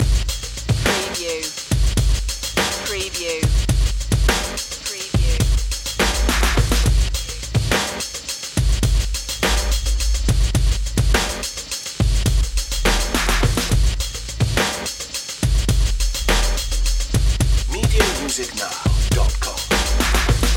night games loop

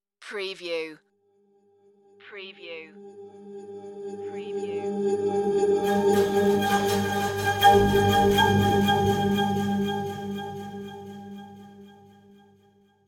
shiny gleam revelation

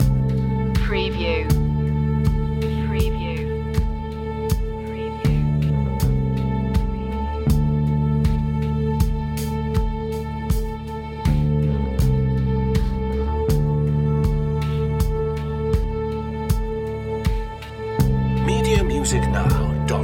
awakened no guitars

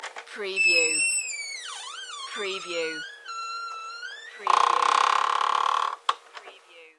Crky Door

